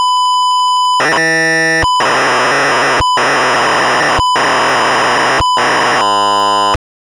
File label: save